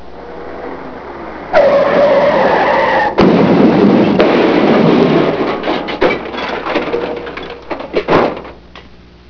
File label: CAR CRSH